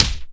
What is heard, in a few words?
minion melee damage slap; smite; npc; roman archer